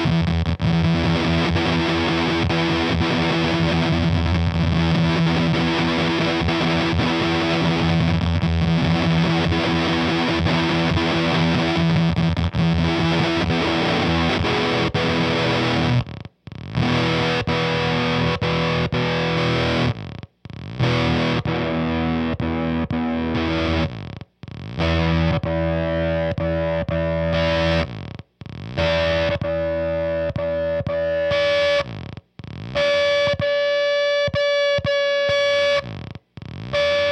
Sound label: digipot disassembly